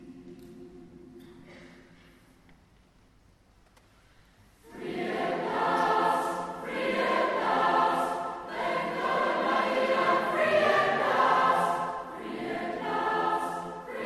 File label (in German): Chor und Jubilaeumschor; Free At Last; Traditional Jubilee